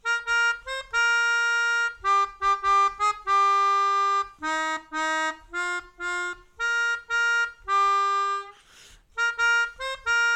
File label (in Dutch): We zijn er bijna, Melodica